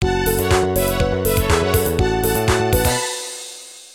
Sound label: jingle disco, phone; fruit factory 2